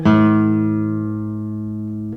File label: guitar